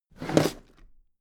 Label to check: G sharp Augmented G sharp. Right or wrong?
Wrong